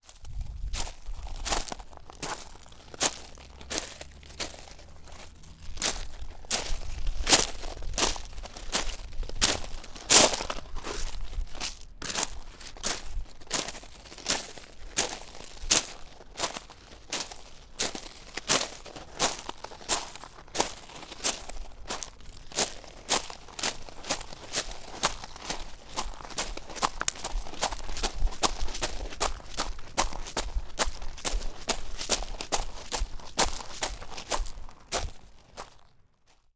gravel running and walking in the gravel bed at the supermarket parking lot